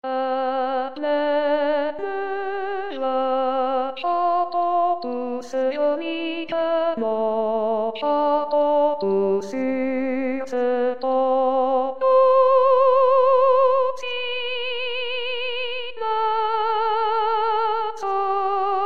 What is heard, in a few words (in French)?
a pleine voix